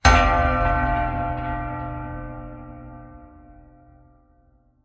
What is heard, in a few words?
Bell Strike